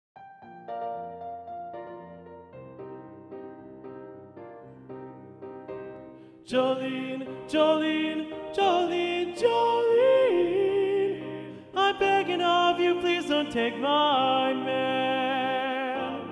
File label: Solo; Practice Room